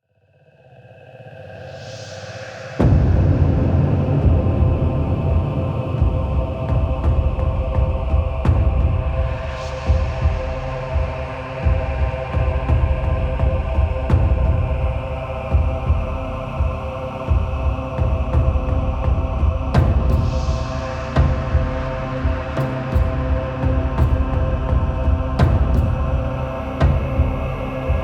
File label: Login Music; Login Screen; Kuva Lich